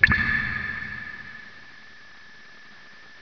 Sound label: drip